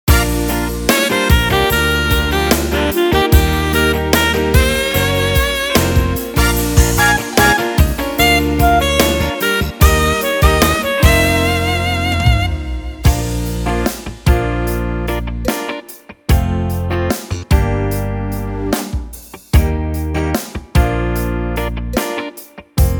Ballad Gospel

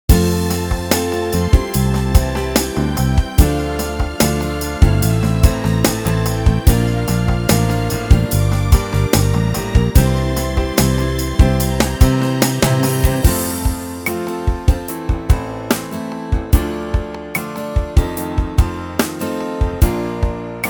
Ballad Pop

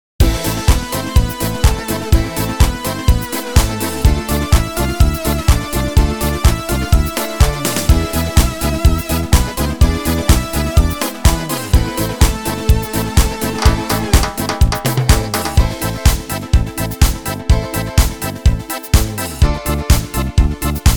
Disco

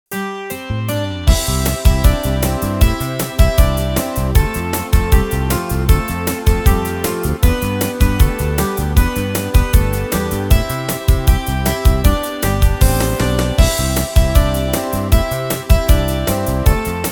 Beat

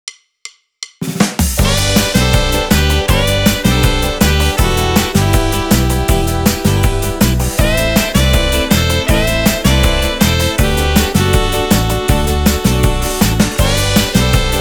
Folk Twist

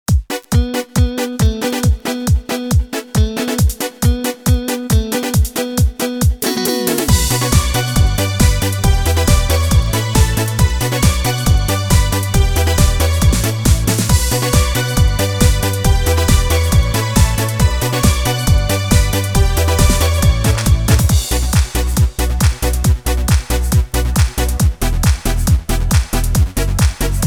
Disco

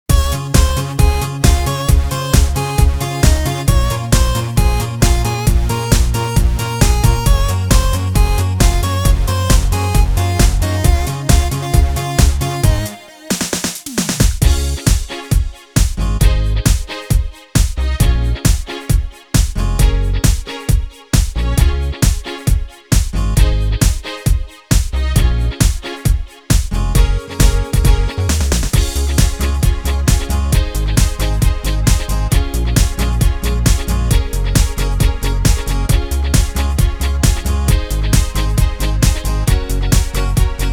Octave Dance

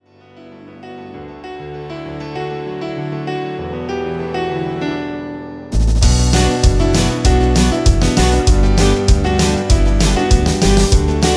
Beat

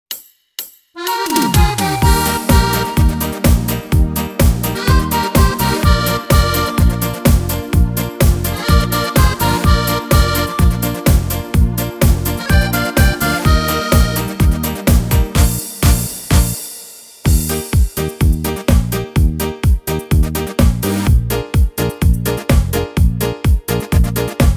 Schlager

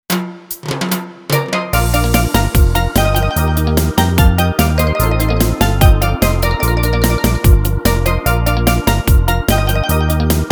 Vox Hit

